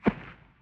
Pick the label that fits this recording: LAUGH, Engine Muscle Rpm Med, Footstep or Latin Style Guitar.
Footstep